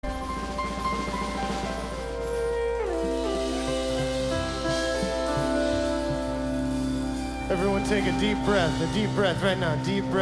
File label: Ambient Jam